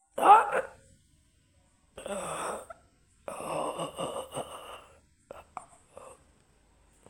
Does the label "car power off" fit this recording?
No